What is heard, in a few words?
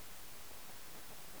Barbastella barbastellus; Colle di Tenda